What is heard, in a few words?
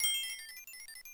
Starman Bounce; Wii